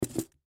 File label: Piggy Bank Set Down, Piggy Bank Empty Set Down; Money Sound Effects; Foley; Blastwave